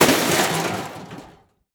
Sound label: Crash Aluminum Garbage Can Dropping On Pavement; crashes; AdobeCC